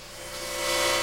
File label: HELMET SOUND, Science Fiction; Mower Man